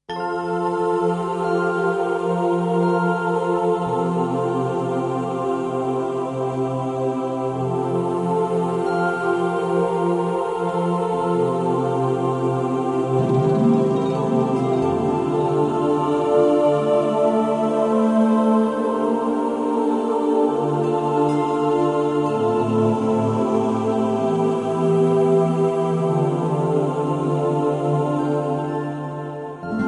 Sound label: Angelic Atmospheres